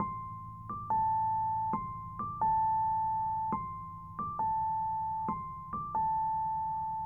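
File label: piano, D minor, Hip Hop & RnB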